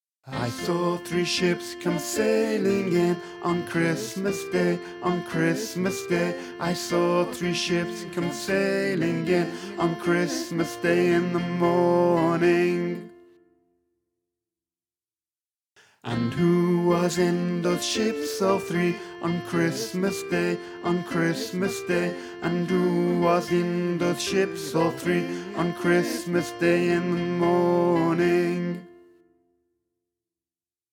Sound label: alto; christmas; I Saw Three Ships